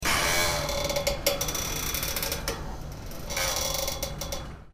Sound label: cable